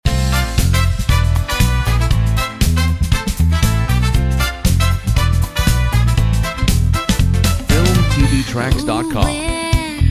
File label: Cuba groove